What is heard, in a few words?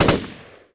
m 16 ext shots